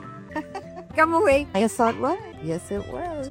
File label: Giggling